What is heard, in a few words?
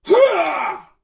soldier battle sounds effort; Menador; SyrinScape; Iris hill manor